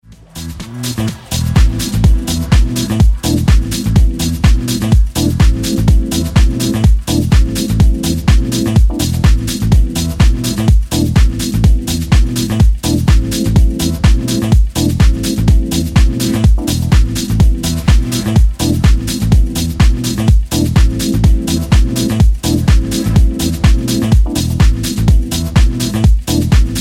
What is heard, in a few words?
Instrumental